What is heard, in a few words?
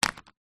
household remote control tv plastic set down table; zapsplat